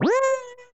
Player Ejected; asteroid 3d surround sound! (left/right); Conjure

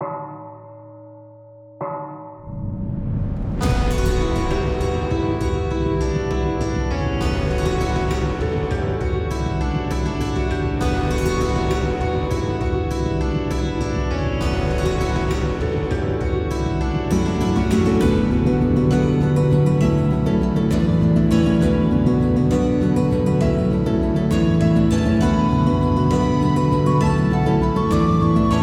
First Loop; PFE OmniVR

